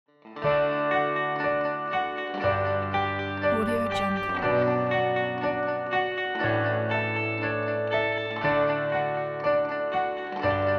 happy corporate

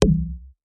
impact shield impact small; Robotic Lifeforms 2; soundmorph

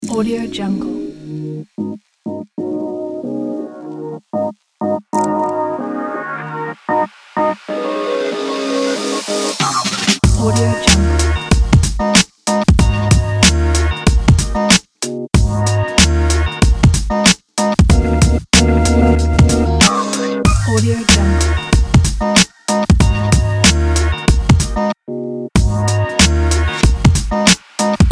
hiphop beat

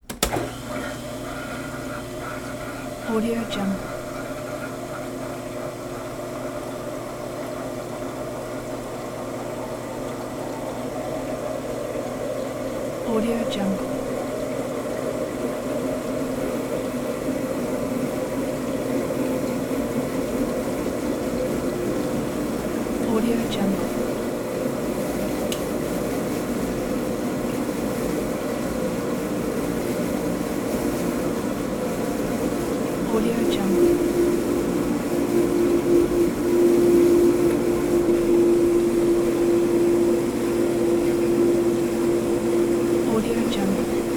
dishwasher run on quick cycle off; prosoundfx

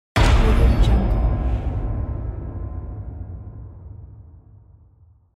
hit

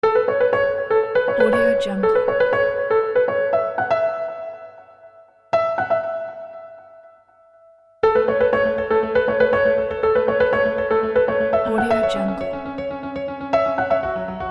inspiring

